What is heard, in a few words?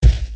Spider Footstep; creatures organic